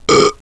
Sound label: (BELCH); Simpsons Cartoon Studio™ Demo